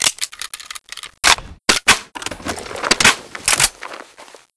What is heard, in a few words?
reload, weapons; rot